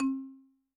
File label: marimba; Yamaha DTXpress-II